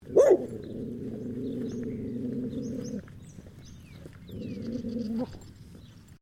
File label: ANIMAL DOG BLUE HEALER BARK GROWL; Various domestic dogs; Animals